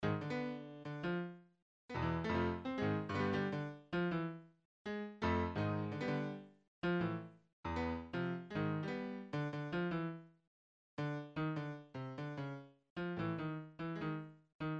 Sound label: piano silliness; gtrsnipe